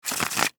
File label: Cards Shuffle